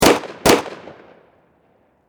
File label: Warfare Pistol 45 Springfield Double Shot Close Perspective; Pistols